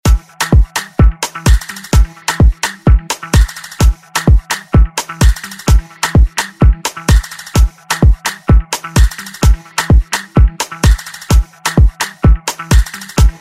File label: Afrobeat Redrum